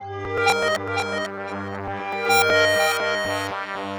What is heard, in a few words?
Synth loop (120BPM); musicradar